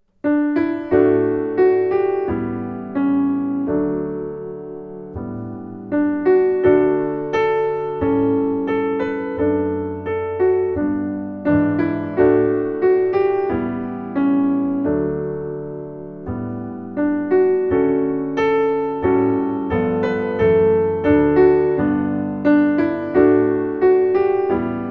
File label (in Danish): piano melodi; I skovens dybe stille ro; DR Musikariet; Skolernes Sangdag